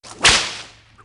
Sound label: whip; soundboard